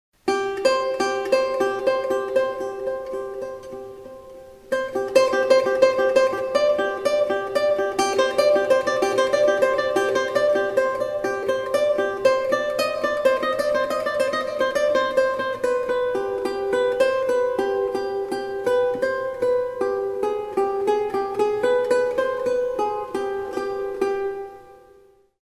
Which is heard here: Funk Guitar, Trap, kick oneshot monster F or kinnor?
kinnor